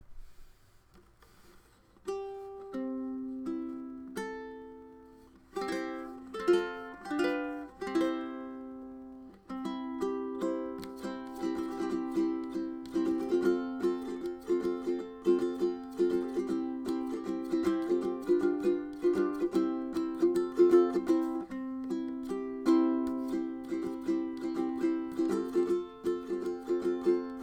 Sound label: Boat Paddle